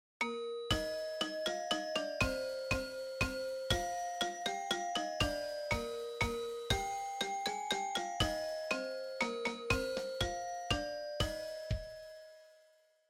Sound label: We Wish You a Merry X-mas play back perc